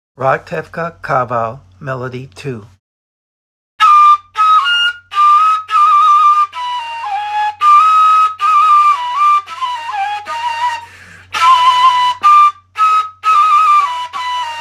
kaval; Ratevka

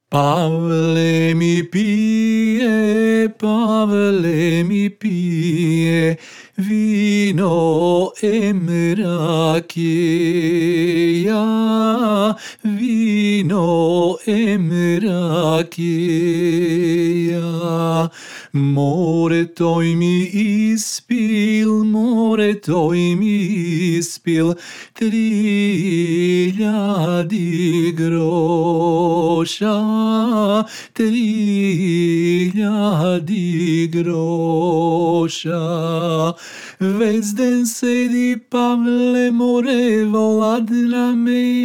Pavle mi pie melody verse slow

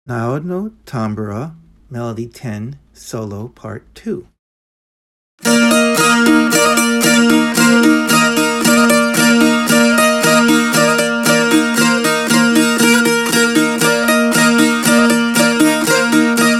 tambura solo; Narodno

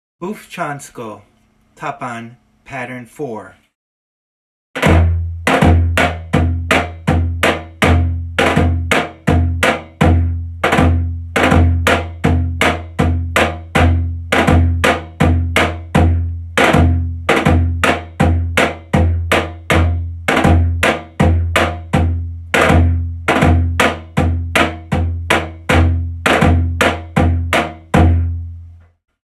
tapan; Bufcansko

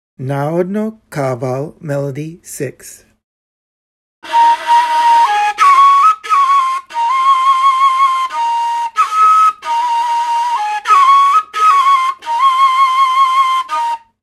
kaval melody; Narodno

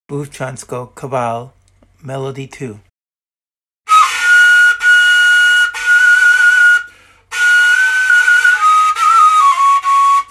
kaval; Bufcansko

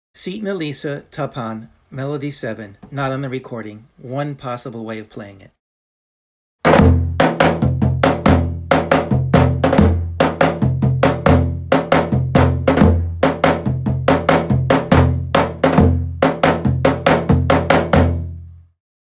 Sitna lisa melody

